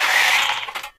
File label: projector screen down